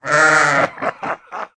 wolf Hide Sheep; fairyred

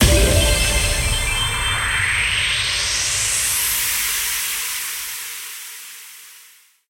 big win start; spinsane

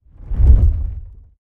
buy close; silverback

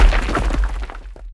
reel Bounce background; parthenon

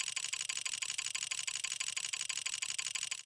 auto play; reel steal

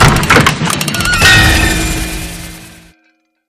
wheel stop x2; wild wild west

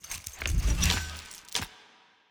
symbol lock; druids dream

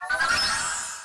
ladder meter full; codex of fortune

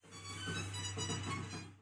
heartbeat; superstriker

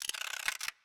scatter Counter Reset; cashomatic

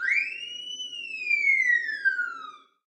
amb Police Siren Oct Up; hotline2

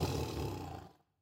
panther fx low; serengetikings

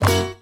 pointer count up; willyshotchillies

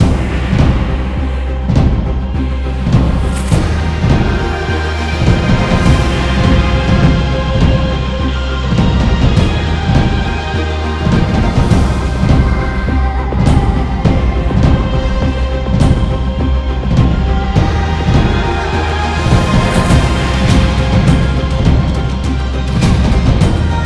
freespin; parthenon

